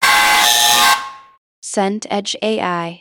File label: intercom buzz